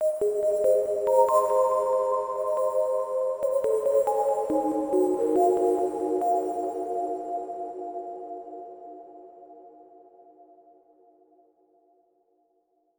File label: arp